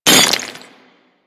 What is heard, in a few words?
Melee Impact Cement; Warframe; Weapons